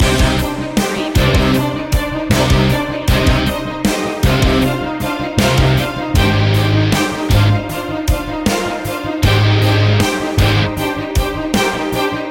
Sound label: drama heavy loop